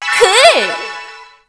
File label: COMBO COOL